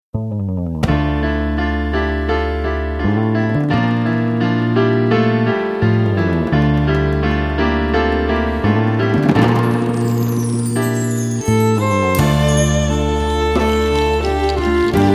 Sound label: gamla melody